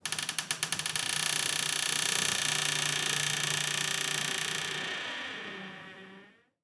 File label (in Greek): ΠΟΡΤΑ ΞΥΛΙΝΗ